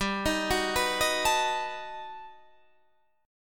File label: G Augmented Major 9th, Guitar, Half Step Down